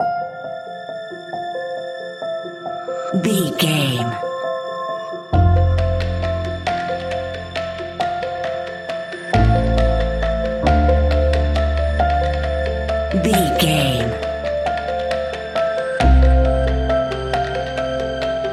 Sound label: sentimental uplifting piano and strings full